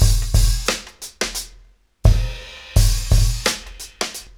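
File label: 88 bpm, Funky Drums; AMG; Now CD-ROM (Roland); Neil Conti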